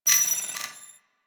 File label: Side Pillar Rand, Small Cargo Room; Warframe; Ambience